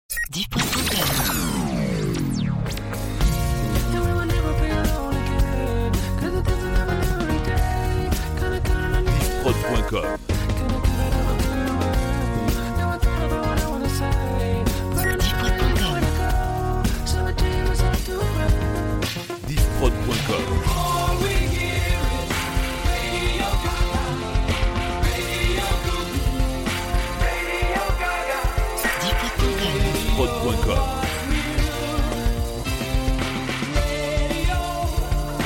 Medley Pop Rock Funk

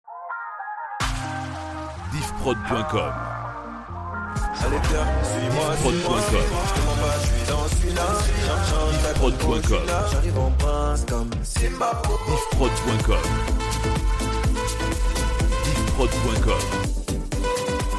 Medley Hits 125Bpm

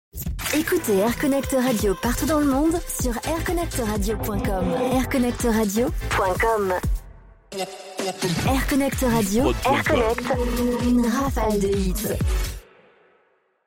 Jingles; Air Connect

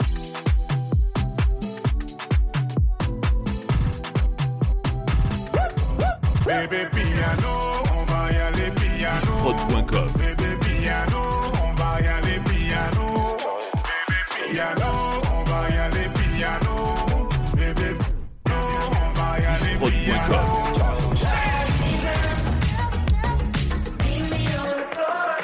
Medley Hits 130 BPM; Diffprod

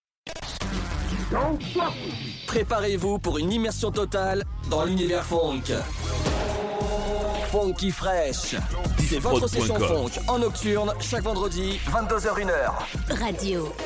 Promo Phonk; PhonkyFresh

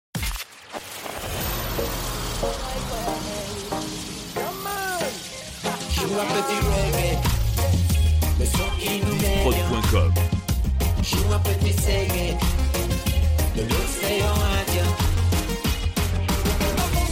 Medley Urban Reggae

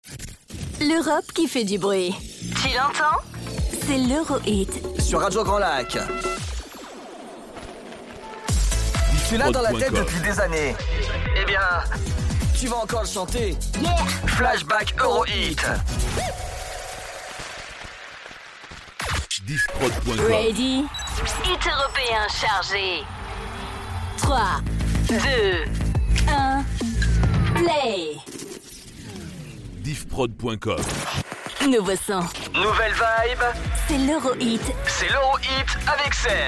Jingles; EuroHit

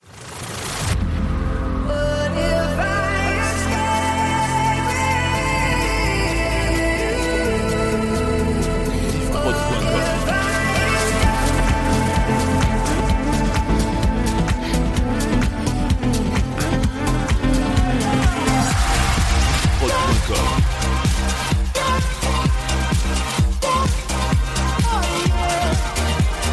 Medley EDM IDM

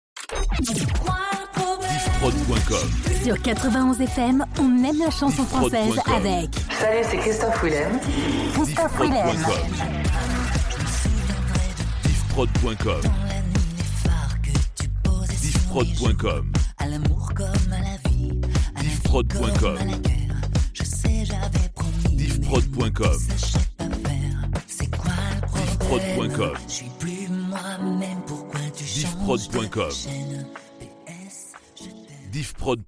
Power intro; 91 FM

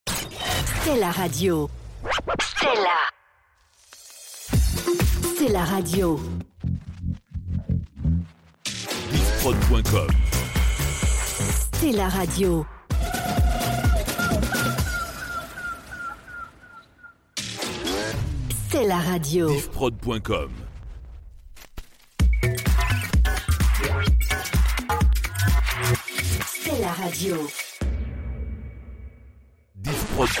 Radio Sweeps ID House; Stella Radio

